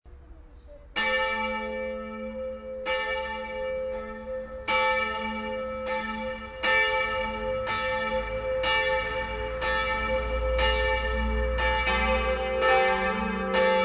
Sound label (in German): Tonaufnahme c1 e1 g1 a1, glocken